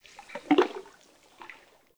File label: Under City Cavernous Water Lap Rock